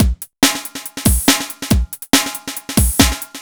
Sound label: Synth Loop BPM140